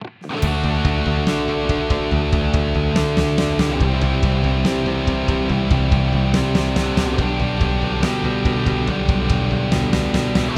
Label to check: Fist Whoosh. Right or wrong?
Wrong